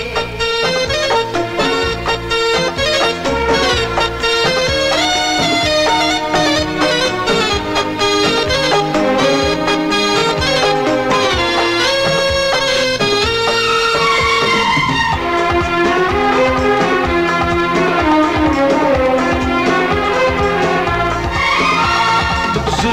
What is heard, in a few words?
Instrumental Tone